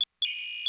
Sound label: padd program, bajoran technology